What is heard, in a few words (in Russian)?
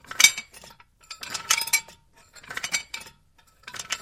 jeleznaya postup eho voynyi, dospehov; zvuki dospehov